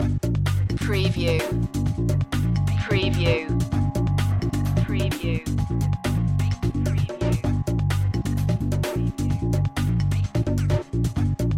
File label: twist or stick loop